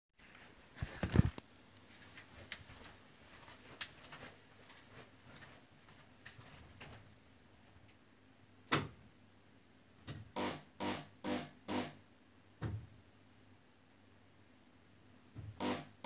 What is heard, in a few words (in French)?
enregistrement pompe de relevage